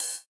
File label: ride